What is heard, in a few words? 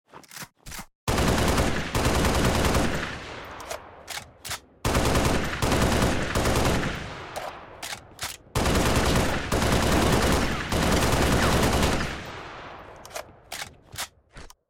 AR sound effect